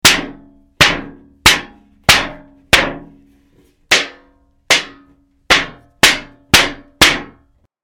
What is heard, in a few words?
metal hit